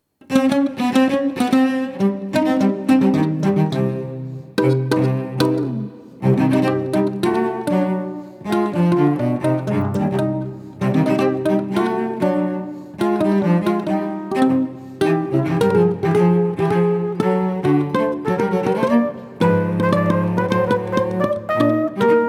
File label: Rhumba